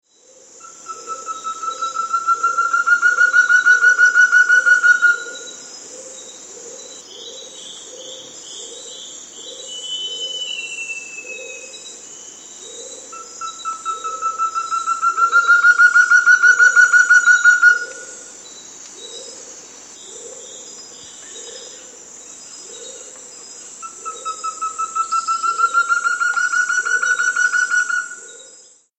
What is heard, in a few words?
Black-Headed Antthrush; S. America